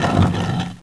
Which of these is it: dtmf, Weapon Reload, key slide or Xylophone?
key slide